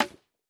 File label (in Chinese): 竹放置声